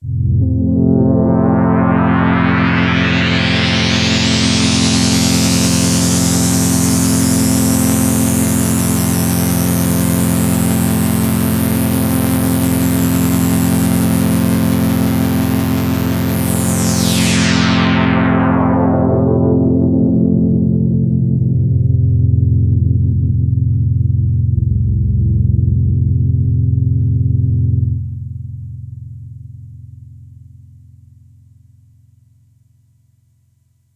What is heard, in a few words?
Sequential; Sequential Take5